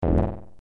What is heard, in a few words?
SFX Springboard; meco kong windows gamerip 2013